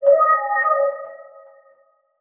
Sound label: Title Transition Logo; Production Element